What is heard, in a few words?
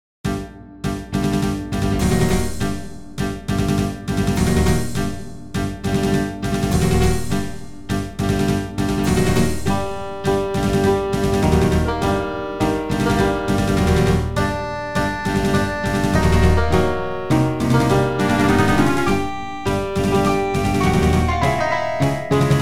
deathmarch